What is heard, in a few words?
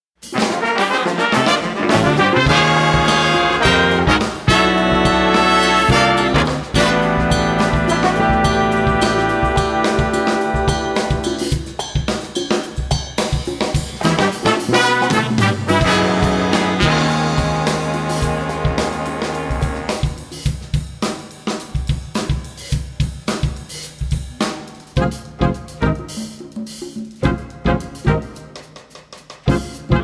Wind Chimes